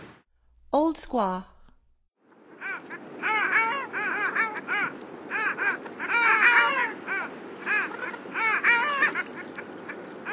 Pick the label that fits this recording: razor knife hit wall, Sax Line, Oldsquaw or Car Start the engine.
Oldsquaw